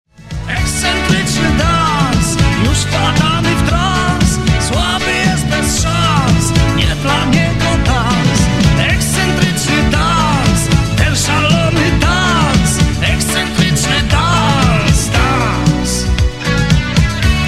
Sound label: dance